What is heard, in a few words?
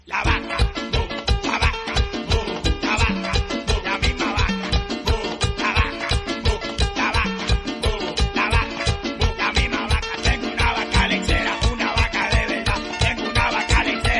Mobile Ringtone